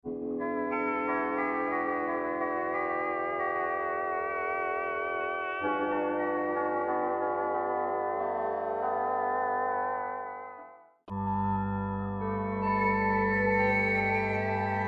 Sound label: sq80 nasal